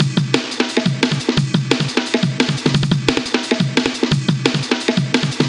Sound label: D&B Break 175BPM